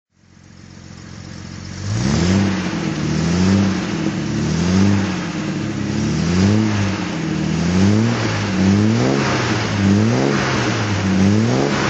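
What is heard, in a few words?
alvis td21 throttle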